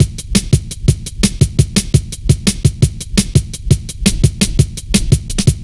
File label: wet biscuit, Drum Loops, Drum&Bass, 170; Total Drum&Bass